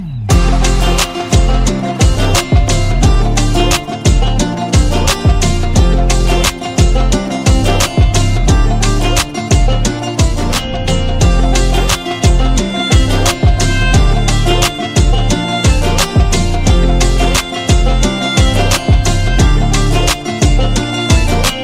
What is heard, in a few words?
Instrumental Tone